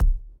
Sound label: Ball Player Hand Hit Tackleball Light